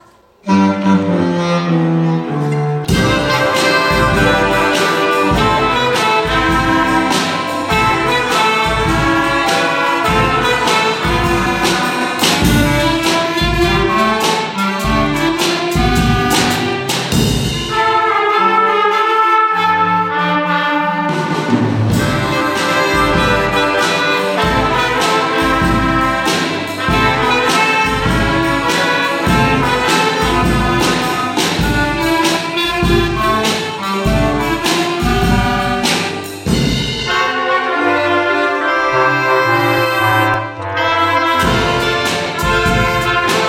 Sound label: jingle bells orchestra